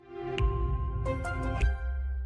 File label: intel core sound